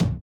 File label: kick, house